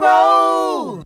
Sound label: "row", Voice